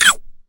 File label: Bite